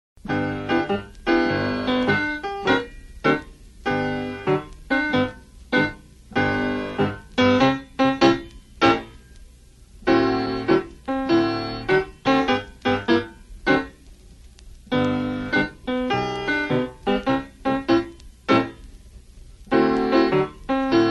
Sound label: walking chaine shuffle hop toe comb